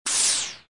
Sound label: menu swoosh